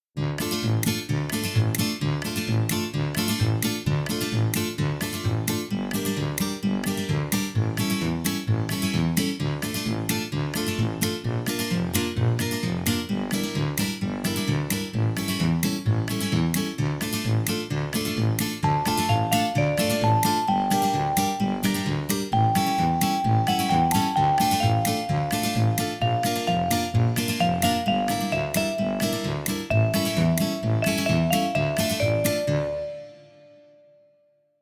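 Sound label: backing track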